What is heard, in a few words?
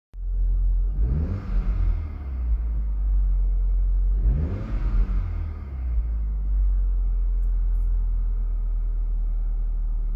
Cupra Sound Actuator off